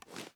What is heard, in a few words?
sm uzulu reload fast arm; gmod; iw8